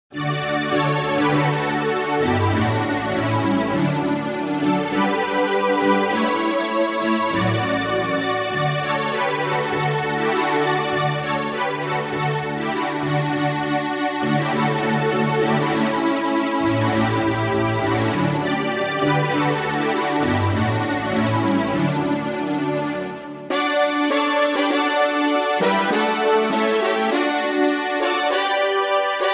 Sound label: Joy to the World Instrumental Version; Arduino Christmas Light Controller